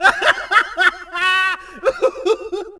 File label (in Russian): smeh